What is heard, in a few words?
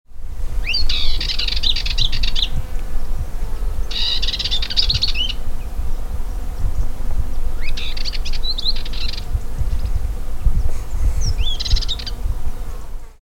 Dartford Warbler